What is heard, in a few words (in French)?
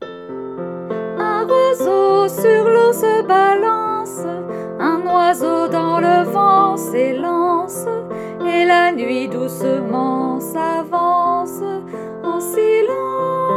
roseau voix